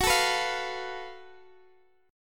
F sharp Minor Major 7th Double Flat 5th, Guitar Open D; chordshape; down